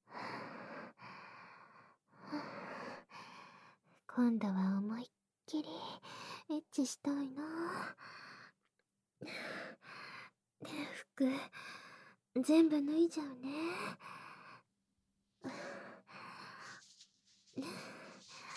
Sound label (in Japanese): オホ声生ハメセックス; KU100